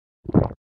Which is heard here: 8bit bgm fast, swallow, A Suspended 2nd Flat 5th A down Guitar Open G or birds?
swallow